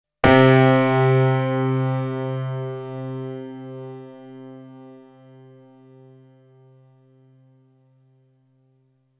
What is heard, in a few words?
Dark Piano; synth patches Korg Prologue